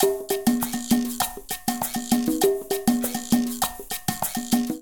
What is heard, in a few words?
Bomba-199bpm, Latin Percussion; Ableton; Factory Packs